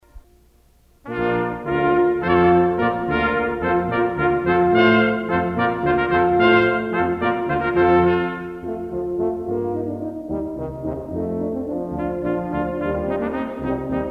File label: Hornpipe